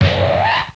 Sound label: Thump 'n' Scream